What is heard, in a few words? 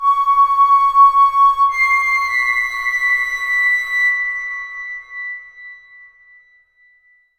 germs, music mob; Left 4 Dead 2